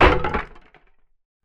neozhidannij stuk ot upavshego derevyannogo predmeta

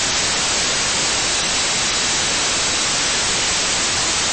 ne lovitsya signal na televizore

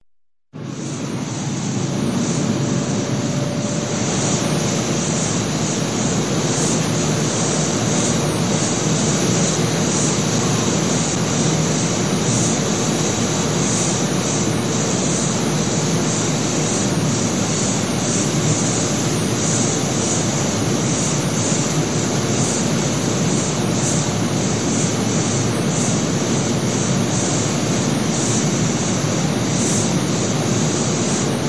zvuk himchistki ceh chistki odezhdi

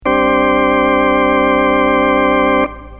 organ odinochnij

